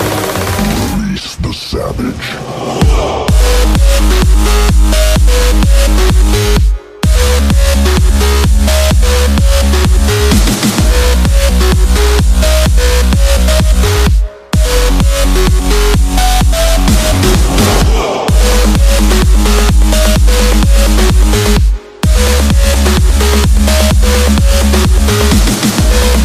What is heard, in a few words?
Electro